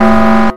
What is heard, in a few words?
heart minus